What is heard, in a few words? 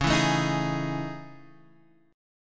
A Major Sharp 11th, Db